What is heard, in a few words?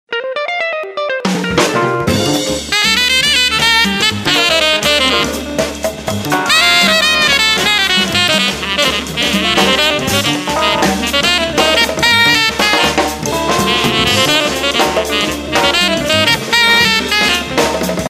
Jazz Bar; Samsung GT-S5603